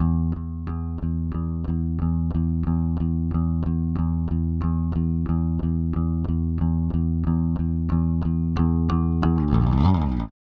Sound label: Rock, Bass; Bass X